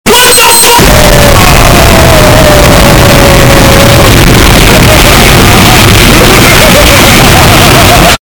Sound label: ka boom earrape